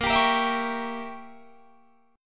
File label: Bb Diminished 7th Bb Guitar Drop D; Bbdim7 strummed